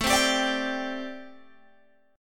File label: A Minor 11th A Guitar Open G